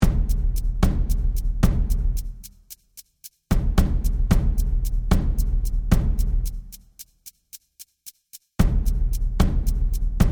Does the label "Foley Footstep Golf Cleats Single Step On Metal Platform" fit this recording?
No